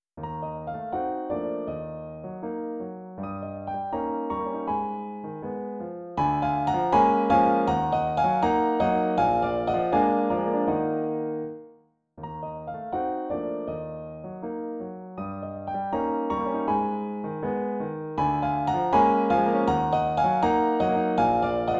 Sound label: piano; spanish latin american